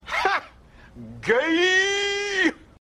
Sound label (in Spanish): tono de cancion clasico